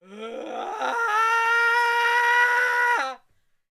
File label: Man screaming gradually increasing intensity